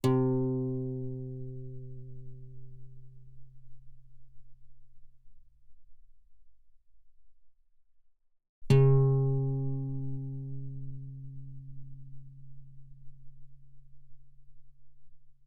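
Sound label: Guitar fortissimo sul E C3 B3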